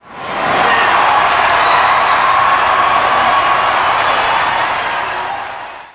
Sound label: cheers; cstrike; knifeapi; homerunbat